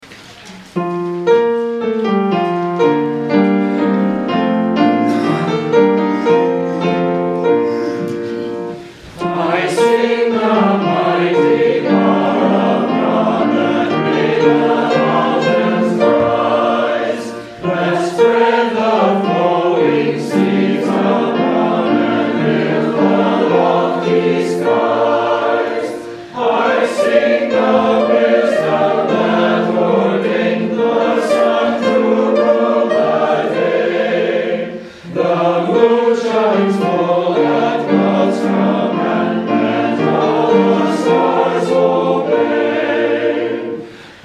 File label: hymn; I sing the mighty power of God